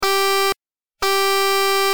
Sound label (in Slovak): klakson 24v kompaktny air compact; fiamm jericho